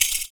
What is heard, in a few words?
FOOT PERC; Univers Sons - Raricussions